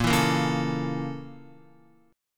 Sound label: Bb Minor Major 7th Flat 5th Bb down Guitar; BbmM7b5 chord; strummed; JGuitar